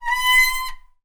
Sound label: transport bicycle brake squeak loud; zapsplat